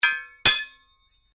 Strike Metal On Metal Heavy Ring Double; Foley sounds for melee weapons; Metal Sound Effects; Materials

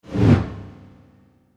Whoosh Medium, Fast, Accent, Transition; Short, Production Element, Imaging Element; BlastWave

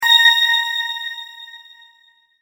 multimedia tone correct

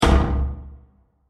Short Impact With Distinctive Reverb; Burst; Impacts; CraftedSignals

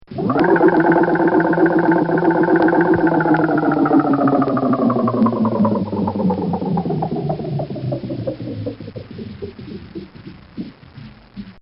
Pulse Motor; Motors; Machines; Bobby Cole

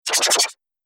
Some Rapid Laser Pulses, laser gun; Sci-Fi Laser Sound Effect; Andrew Fleming

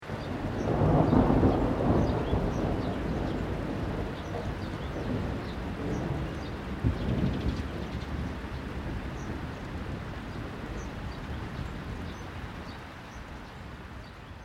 THUNDER DISTANT LOW LIGHT RAIN BIRDS; Elemental weather effects

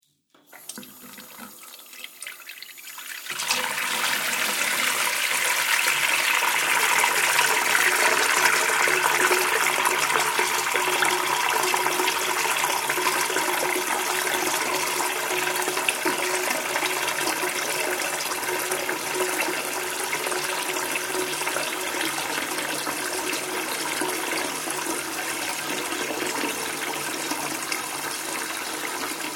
Water Filling A Sink From Tap, kitchen

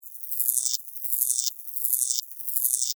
sound design electricity electric arc; Electricity Arcing ( Sound Design ); Electrical; McKinney Sound

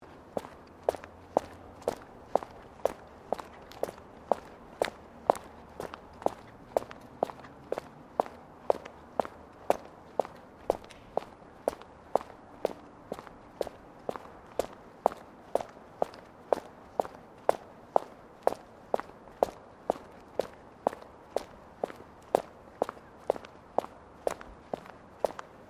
FOOTSTEPS WOMENS LOW HEELS CONCRETE SEQUENCE; Footstep Foley; Footsteps (Sound Effects:Human)

